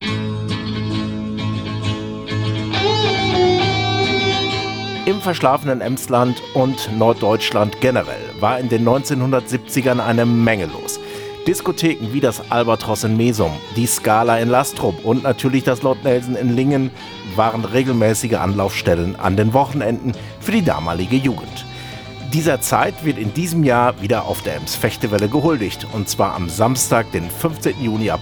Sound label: jingle; kult diskotheken radionacht auf ems vechte welle